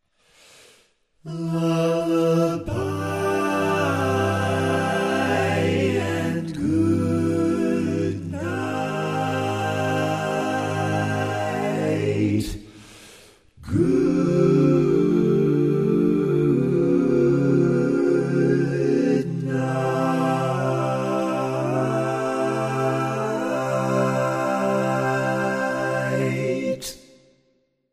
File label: Lullabye and Goodnight, All Parts, barbershop tags